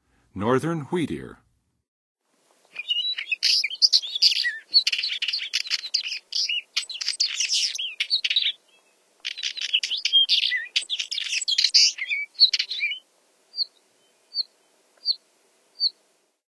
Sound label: Northern Wheatear, Songs of Birds; North America; Western USA; Western USA Songbirds